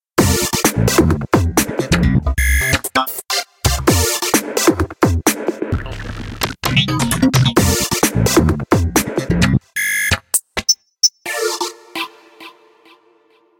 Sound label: Reactive, ringtones